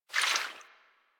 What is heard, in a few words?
Vial Foley; Warframe; DE; Warframes; Alchemist